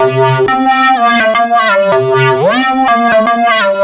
Cutting lead, break beat

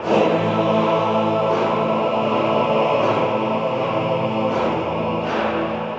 Choir Ens b; Музыка для фильмов

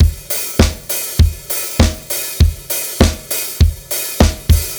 Charisma Drums

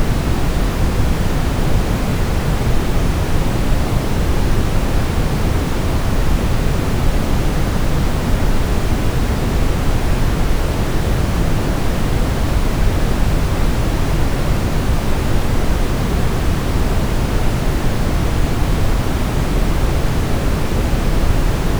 Brown Noise, тестовые звуки

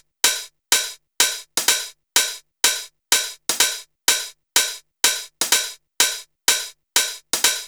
Housed Beat, dance electro; drum сэмплы

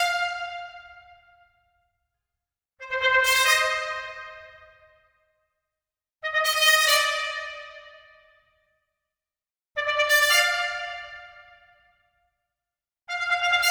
trumpet, напряжение

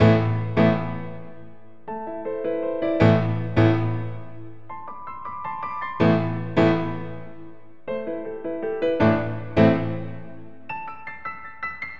Grand Piano; фортепиано

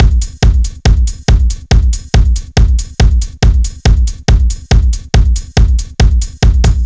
Heavy, trance

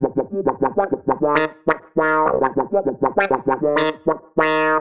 Klav Wah, лупы

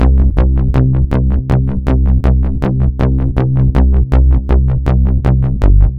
Smacker, Bass; Ambient; 80BPM; Loops

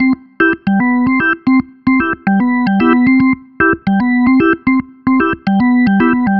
Jolly Organ

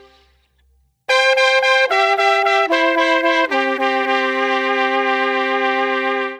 Duo Trumpets, reggae; регги

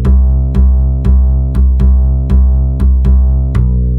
Rhythmic, бас rock alternative

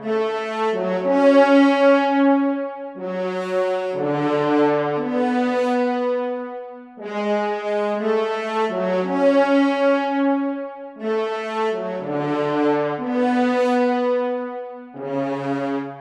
Loud Horn Section, 120bpm

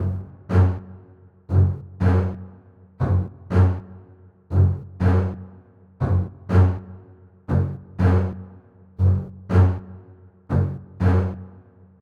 bass, playful waltz

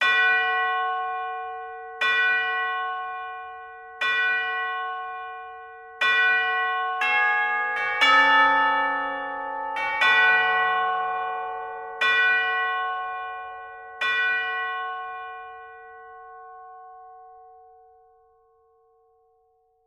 Chimes

